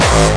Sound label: obnoxious kick; Kicks; single hits